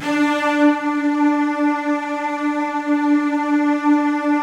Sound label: Virtuoso Strings, D3; ILIO; Kirk Hunter Virtuoso Strings VOL-2; 90 s Sample CDs